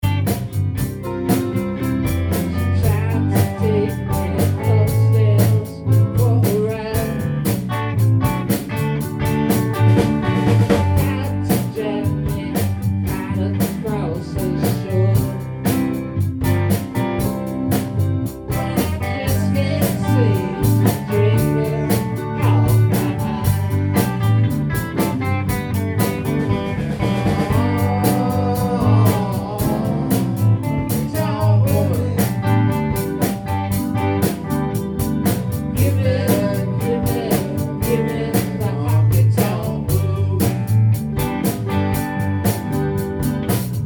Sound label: Honky Tonk